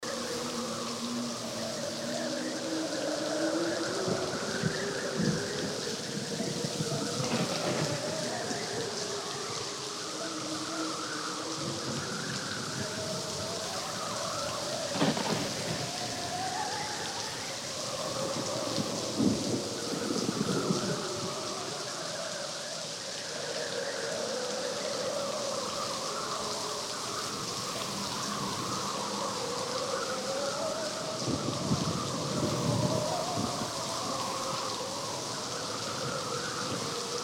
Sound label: rainstorm; Kamino